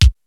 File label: D70 DRUMS; Rubber Chicken Software Sample Bank; DRUMS + PERC